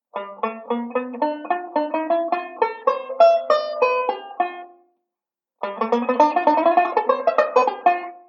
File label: ii V Pattern, banjo